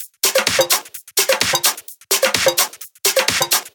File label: Loop, 128 BPM; VES2 Loops 128 BPM